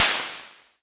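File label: pt30 cymbal, CASIO PT-30; LO FI and 8 BIT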